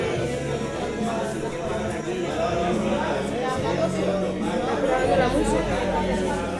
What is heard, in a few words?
In Music Bar